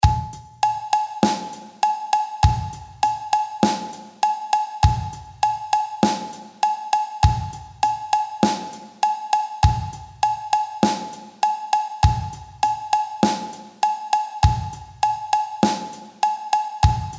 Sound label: sixteenth note